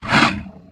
vox attack

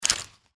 mantis arm attack; npc

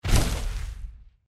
walk, power armor; clothing system

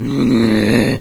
zombie sight; doom3

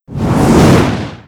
swing

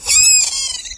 tick death; doom3

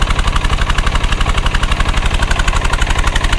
single diesel, engines; acf engines

